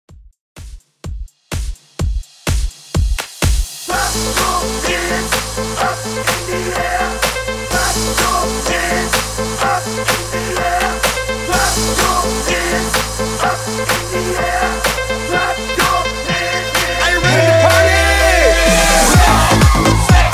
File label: MASHUP 126 BPM